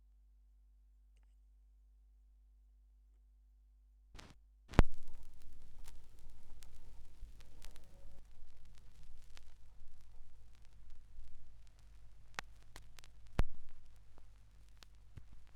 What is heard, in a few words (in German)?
schallplattengeraeusche; rauschpartikel